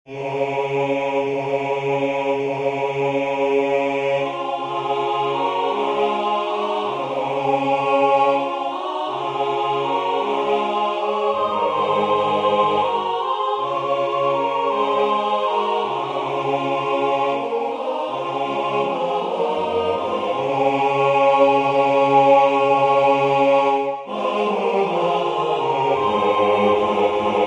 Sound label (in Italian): voc coro